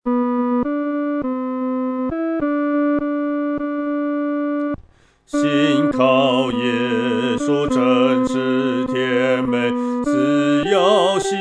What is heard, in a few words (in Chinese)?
独唱（第二声）; 信靠耶稣真是甜美